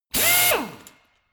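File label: impactor long high, drill; maschinen; bohrer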